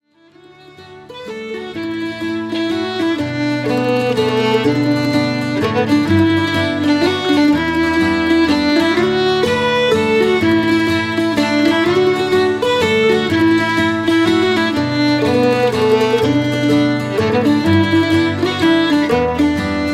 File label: Waltz